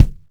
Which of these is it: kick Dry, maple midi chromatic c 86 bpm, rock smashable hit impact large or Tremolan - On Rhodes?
kick Dry